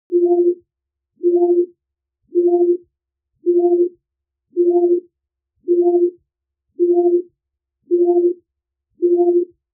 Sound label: scanning sci-fi alarm; mixkit